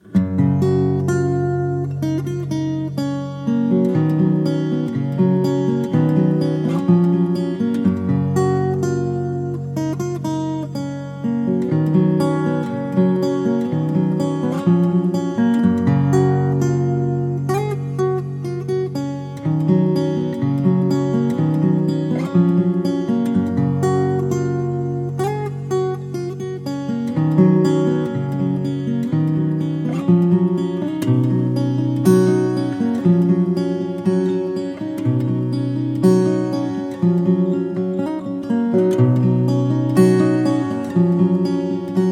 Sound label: Gentle Rain, guitar